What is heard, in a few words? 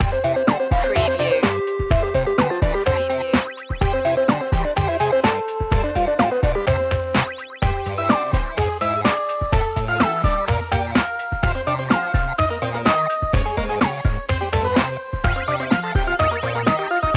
8-bit instincts loop